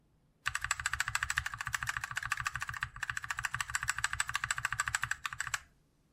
Kailh red ESC; SGK50 S4

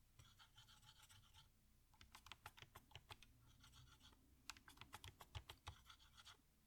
K100 Air Mediakeys

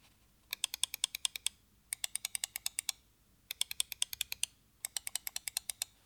CM MM712 LLLLL RRRRR

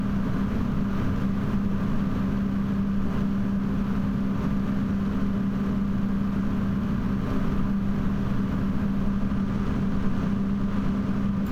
Max RPM Push

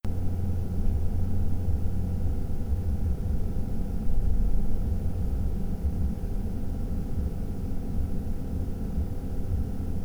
45 cfm

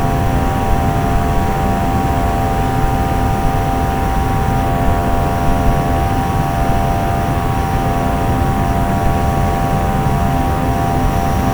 Bearing Noise

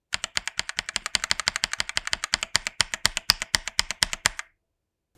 Function Esc; NZXT

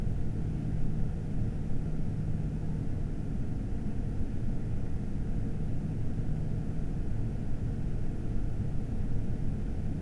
1000 RPM

